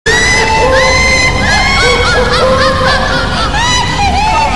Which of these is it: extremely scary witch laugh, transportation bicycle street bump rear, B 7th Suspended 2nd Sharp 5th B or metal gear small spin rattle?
extremely scary witch laugh